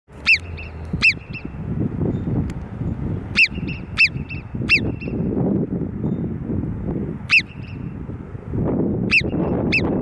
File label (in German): Murmeltier